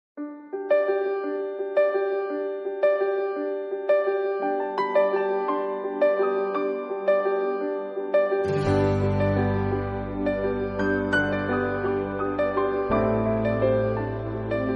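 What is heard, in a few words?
instrumental ringtone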